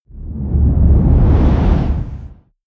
whoosh deep squall heavy

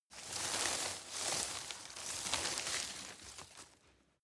grab rustle hard

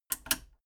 metal button radio click power off fast

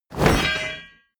drop metal blade large

